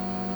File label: type; 1000fx; OFFICE